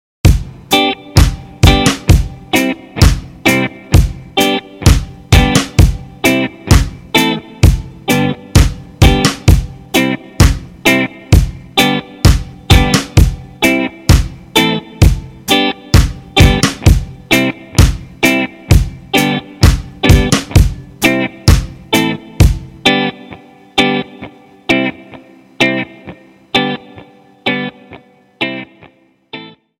Reggae

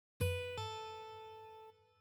Pull Off

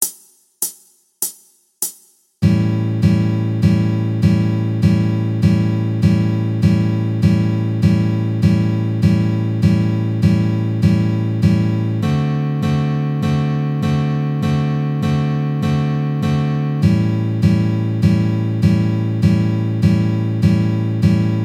Blues Guitar 12 bar blues

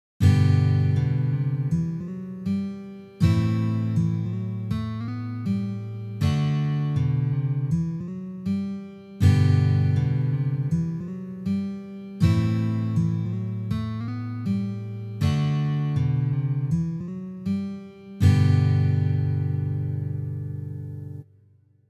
Slides

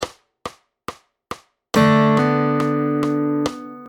Fingerstyle D Chord Hammering; Fingerstyle Fun; P3 Amazing D Chord

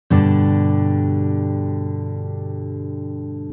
Fingerstyle Movable Chords G

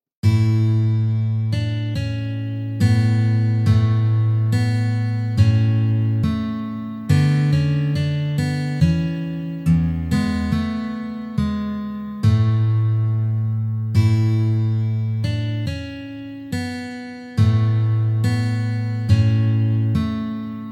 Deck The Halls Medium Slow

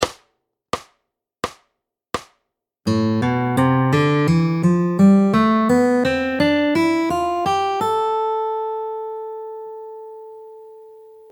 Fingerstyle Spanish Style

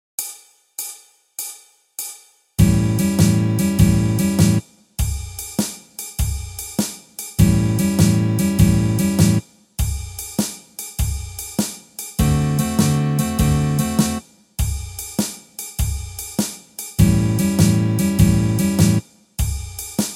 Blues Guitar

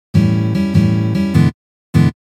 Blues Guitar Turnaround

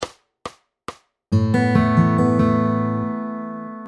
Fingerstyle Movable Chords Asus2

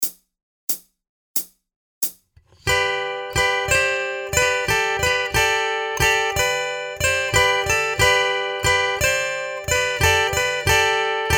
Triads, Slow, guitar; Triads Unleashed

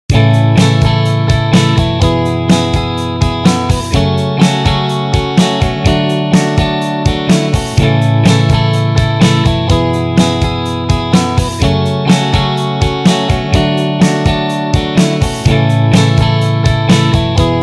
The Ultimate Strum Pattern; Strumming 101

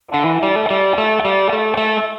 Lick

